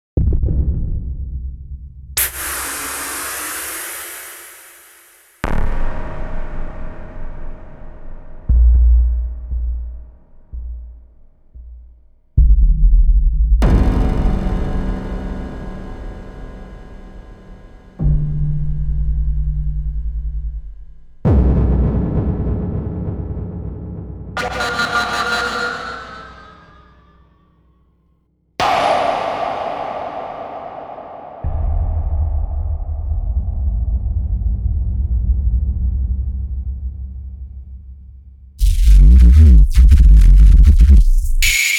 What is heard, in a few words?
Drop; Drum and Bass FX Dubstep Cinematic Techno House; Kontakt NN-XT